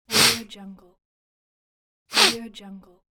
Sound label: human single sniff